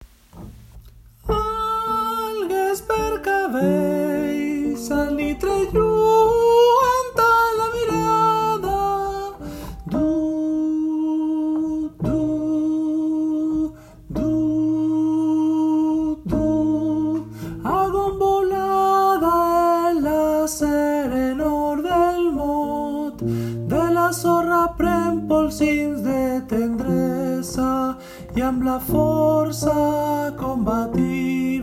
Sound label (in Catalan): Cançó de mar ALTOS